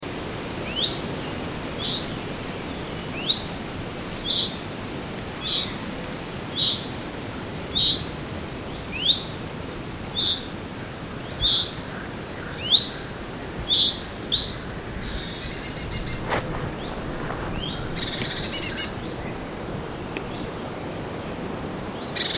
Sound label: Nature And Frog